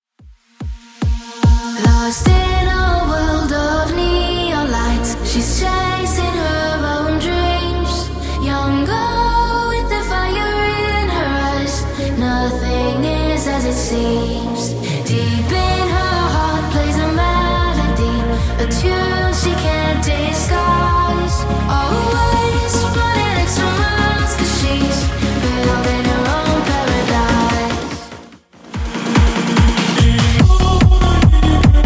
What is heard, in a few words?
Edm Redrum 145bpm